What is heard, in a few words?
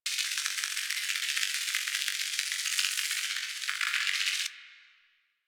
Object Loop; Warframe; Harlequin